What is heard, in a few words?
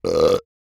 burp; OPENAIR; Anechoic